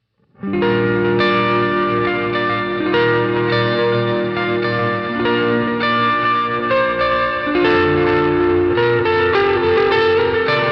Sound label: Duo Jet Ambient